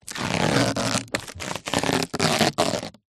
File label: foley rubber thin elastic stretch stress; zapsplat